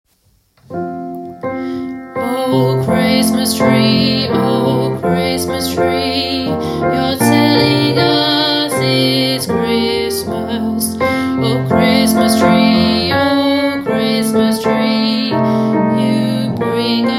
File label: xmas tree low harmony